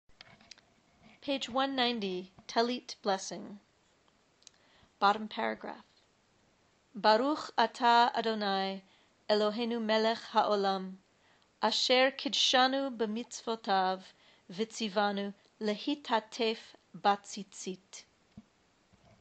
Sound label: Tallit blessing